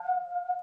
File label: whirly tube F3; instruments multisampled; synth keys